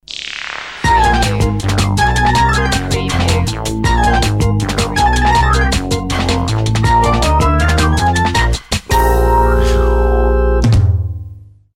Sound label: circus cartoon